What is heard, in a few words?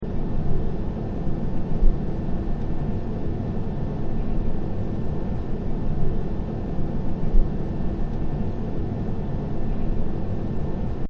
Airplane Ambience; Airplanes; Vehicles